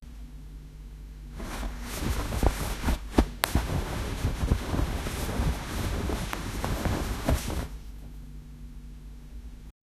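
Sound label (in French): frottement doux de draps d, pillow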